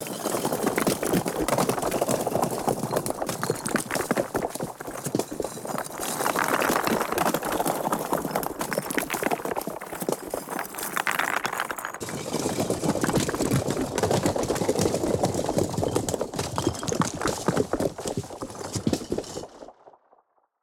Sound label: Rocks Crumbling Falling Designed Rocks